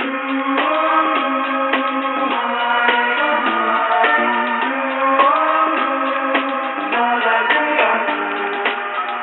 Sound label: soeasy loop nobass